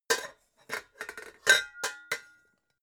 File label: pots put down